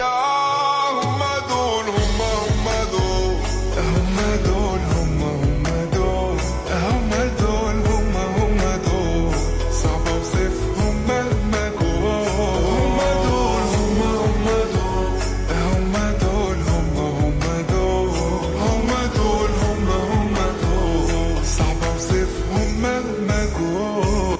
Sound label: Ringtone Slowed Reverb